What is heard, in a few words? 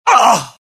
death, pain; mohaa; dialogue